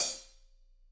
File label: HH, Drums; Bob Clearmountain Drums I